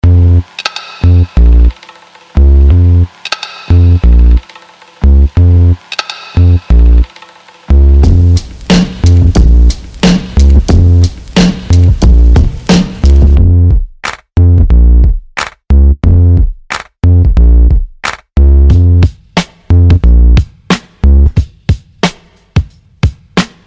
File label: Hiphop Beat